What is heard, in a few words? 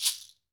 TANZANIAN, African Perc